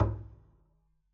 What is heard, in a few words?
pizz noise low thump, double bass; dsmolken; noises